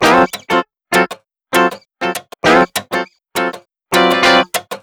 Blues Guitar Rhythm Pulsing D 99

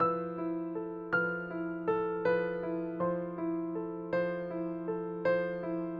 Delicate Piano

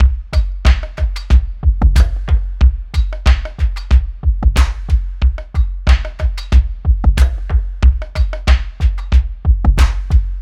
92 full drums; Ambient; Ambient Piano Loops; C and Dm Night Mood

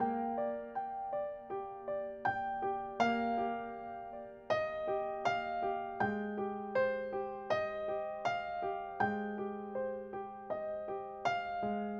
Emotional Piano

